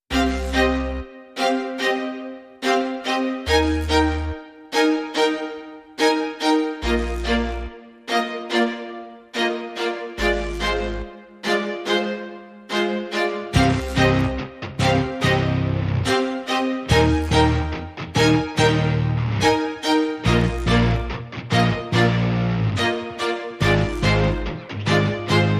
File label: No Drum